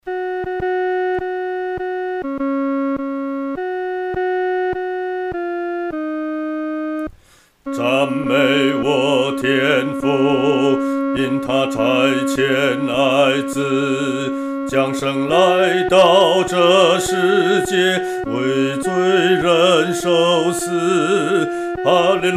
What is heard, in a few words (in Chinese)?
独唱 第二声部; 赞美我天父